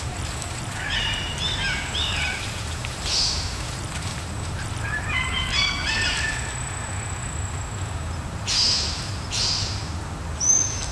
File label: birds chirping distant; animal cries; SFX Engine